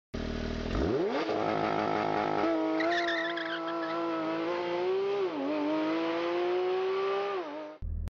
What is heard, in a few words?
porsche launch control